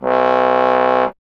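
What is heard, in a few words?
bass trombone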